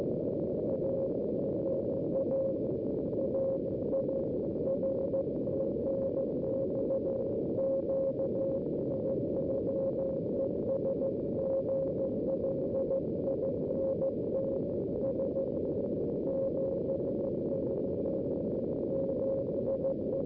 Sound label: CW; Project Moonbounce